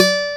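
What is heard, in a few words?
Spanish E3, guitar; AKAI S-Series CD-ROM Sound Library VOL-8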